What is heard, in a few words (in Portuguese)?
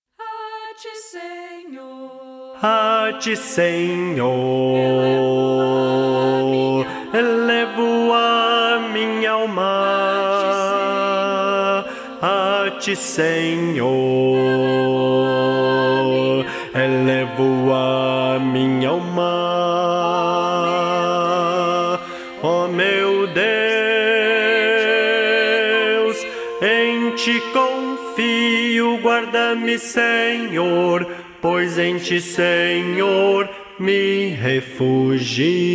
baixo; a ti senhor